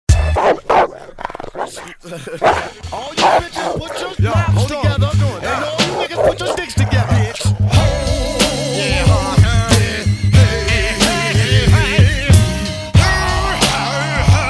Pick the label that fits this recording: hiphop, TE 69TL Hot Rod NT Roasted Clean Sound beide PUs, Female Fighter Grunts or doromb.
hiphop